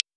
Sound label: IR Crystal